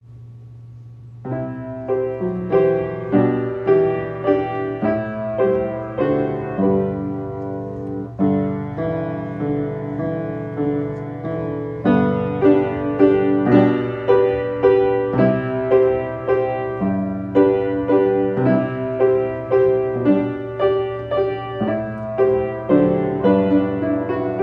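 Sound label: Covered Wagons Accompaniment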